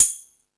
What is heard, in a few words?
Latin Layers, Drum Machines E-MU Pro-Cussion